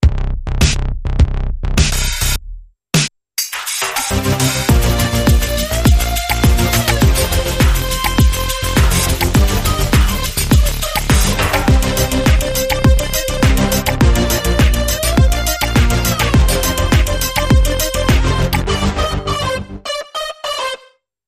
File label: Seven Synths